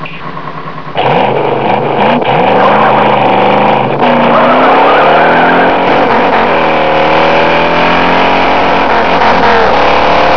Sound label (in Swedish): 426 engine motor ljud